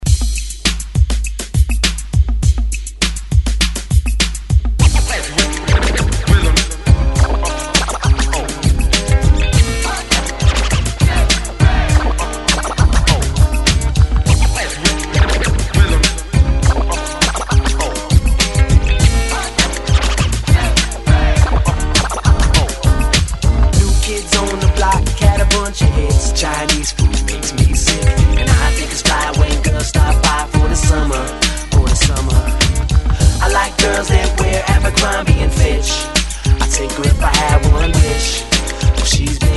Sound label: Throwback Scratch ReDrum; DJDOLLS